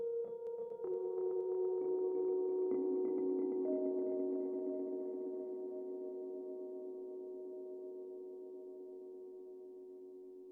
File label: airship inside